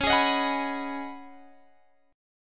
C sharp 7th Suspended 2nd Suspended 4th C sharp down Guitar Open G